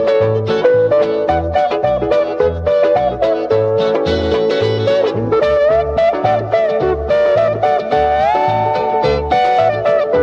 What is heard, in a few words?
whispered serenade